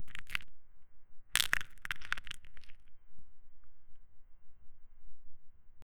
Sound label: one very heavy and rocky, earth